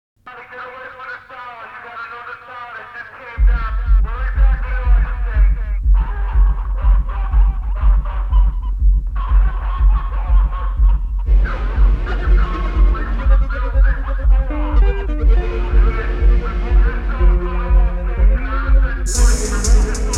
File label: Phone tune